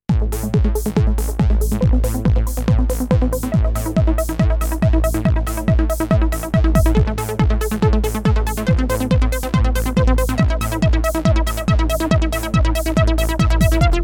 Trance Beat

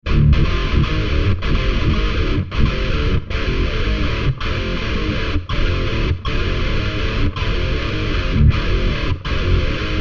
Scooped Rhythm

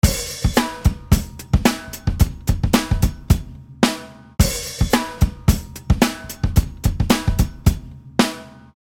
drumloop Unprocessed

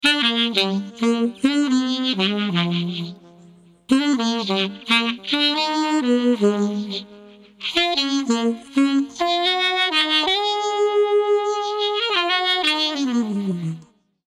Sax Phase Del Trem

